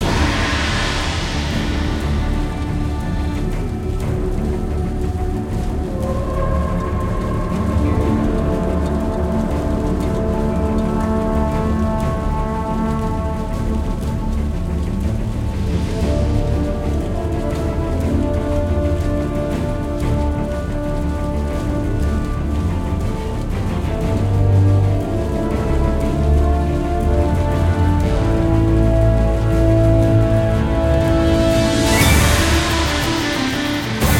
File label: ambience general; warlords